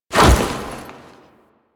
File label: Foley Melee Sweep; Warframe; Kahl